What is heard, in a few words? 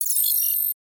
data display, button